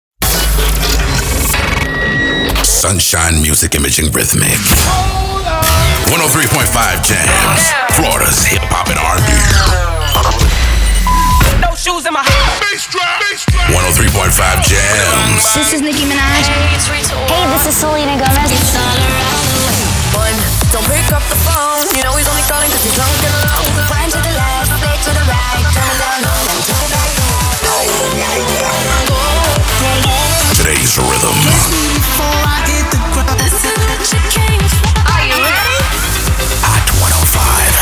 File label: RHYTHMIC